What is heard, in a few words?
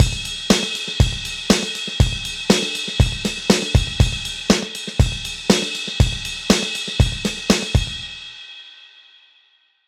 Drum loop HPF 50 Hz